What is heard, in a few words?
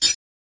moving cursor